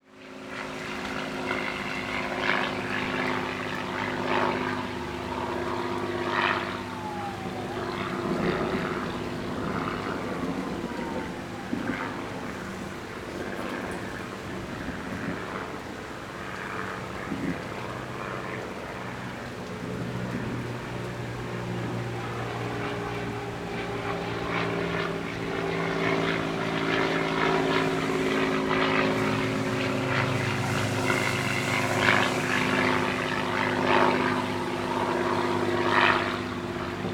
Weather Ambience Rain Light Forest Emergency Police Search Manhunt